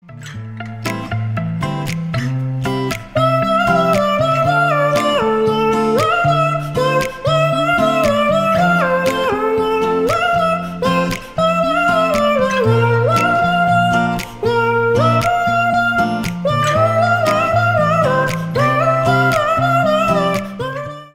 cat cover; bongo cat

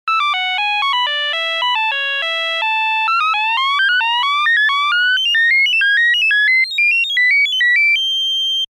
new nokia tone

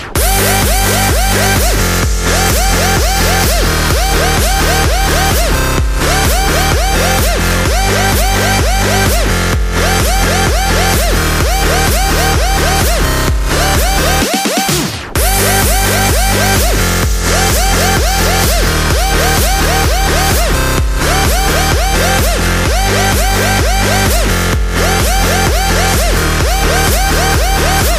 electro tone

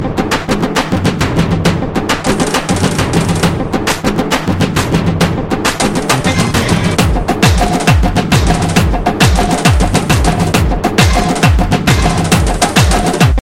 tamil beats

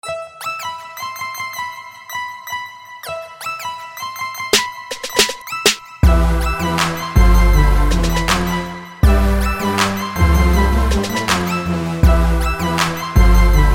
trap

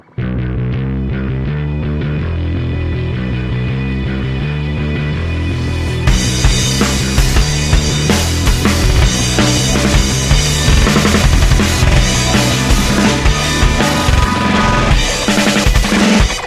guitar solo

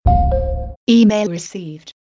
email received

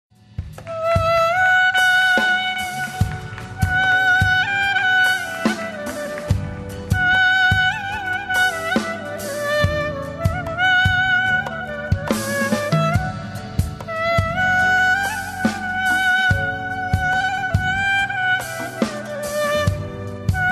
clarinet

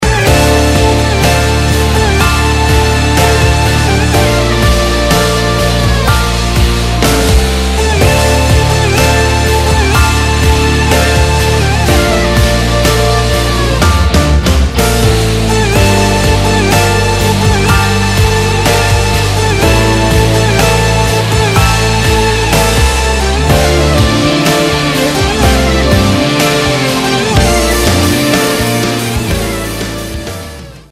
instr

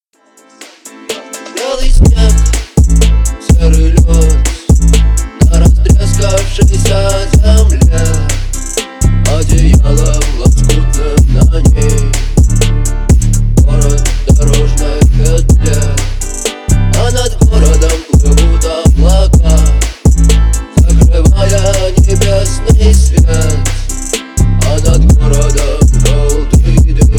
phonk remix

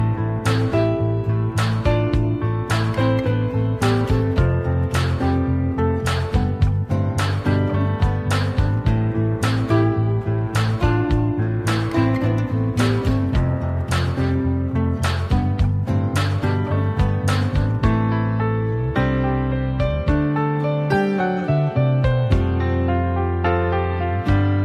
guitar

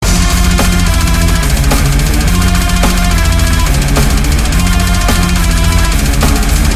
metal riff

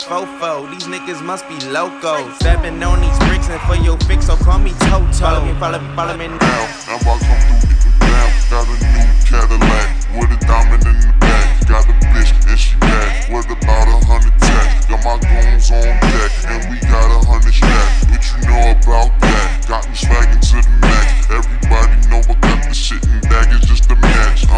bass

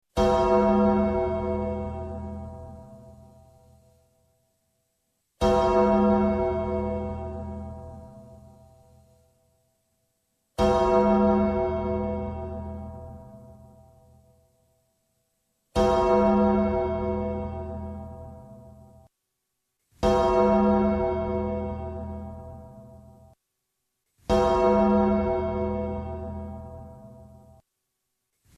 gong

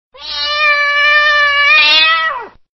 cat alert

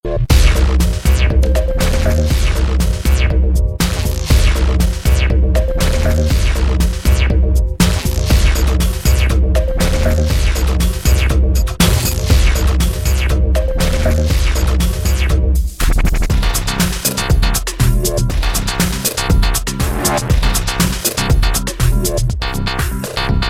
techno robot

